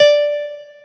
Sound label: piano